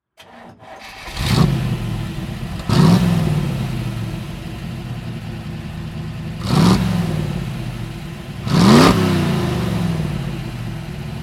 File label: Chevrolet Corvette C1